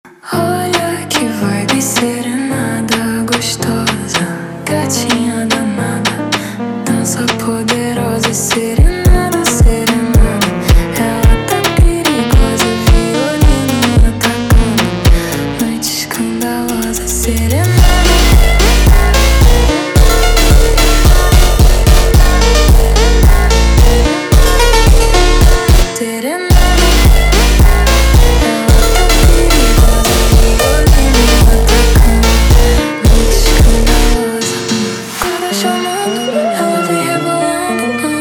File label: slowed, funk